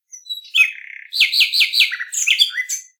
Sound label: nightingale